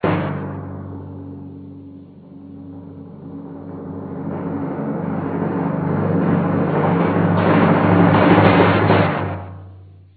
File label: kettledrum; Orchestra; Musical Instrument Experience - The Orchestra